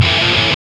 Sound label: GTR; Roland - LA Composer vol. 2